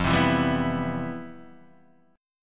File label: C Major 7th, F, guitar chord; Cmaj7/F strummed; JGuitar